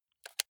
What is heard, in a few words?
console controller playstation press